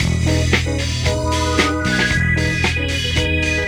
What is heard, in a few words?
Disco 114 E, Lounge Lo Fi Break Fillz